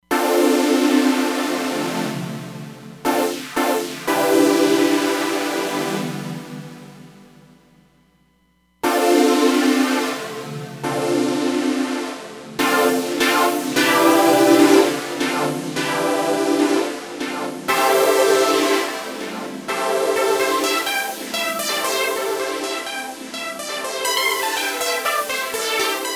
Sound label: Flanging & 2 sec Echo, Roland DEP-5; Factory Patches